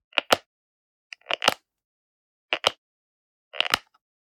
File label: Generic Button Plastic Medium; boom library; The Interface